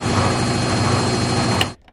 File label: washing machine